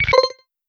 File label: Menu Check Box; Custom UI Sounds; Call of Duty Ghosts